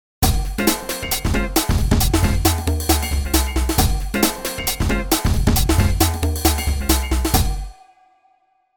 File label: New Jazz; Zoom ARQ